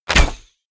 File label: bow shot